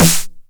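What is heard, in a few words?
909 SD Tape, snare drum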